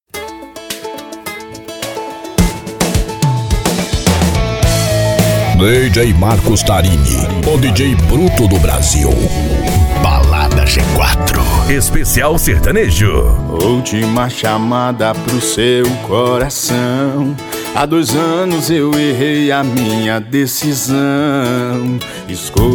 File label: sertanejo; balada g4